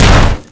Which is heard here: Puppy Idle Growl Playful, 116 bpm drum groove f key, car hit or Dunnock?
car hit